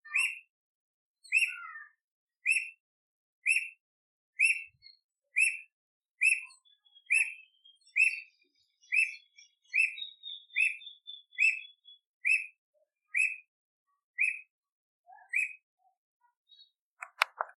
Canto da Alma de gato

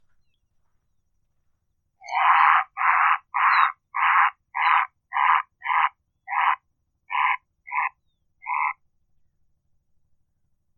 Canto da Garca Moura

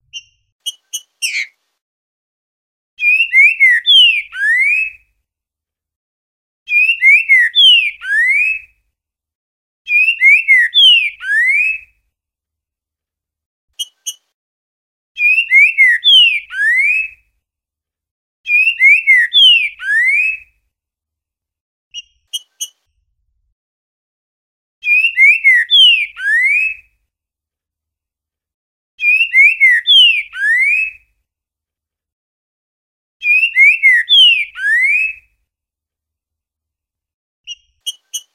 Canto Trinca Ferro Grego Mole

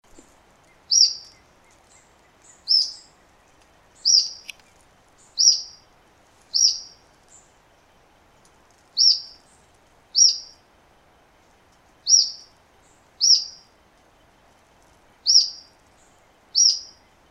Canto do piolhinho chiador

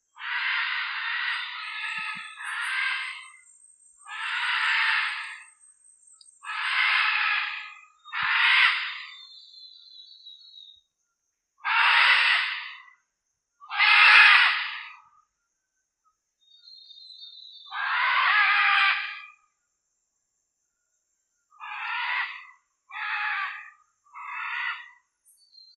Canto da Araracanga

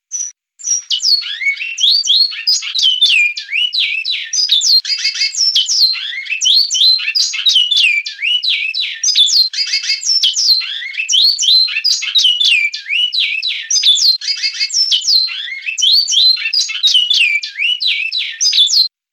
Canto Bicudo Goiano Super Classico

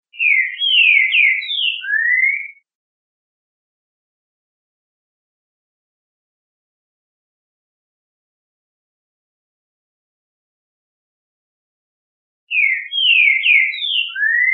Canto Trinca Ferro Classico

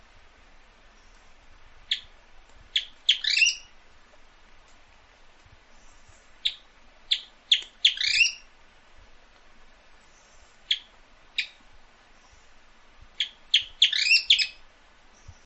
Canto do Fruxu

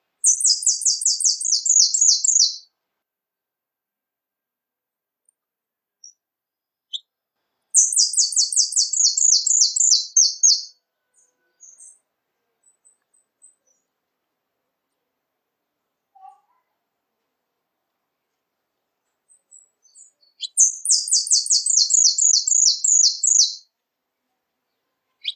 Canto Canario da Terra Retinido

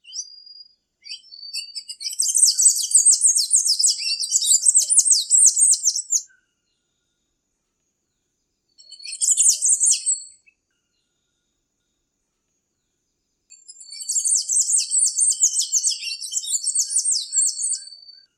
Canto do Sanhaco do Coqueiro

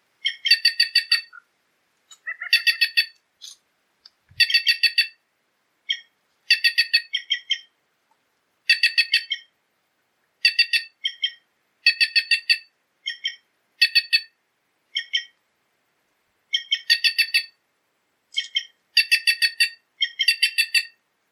Canto da Gralha Canca

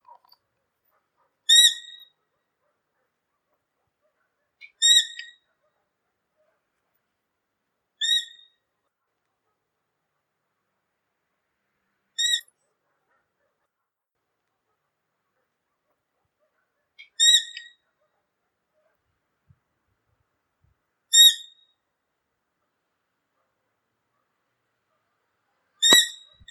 Canto da Coruja Diabo

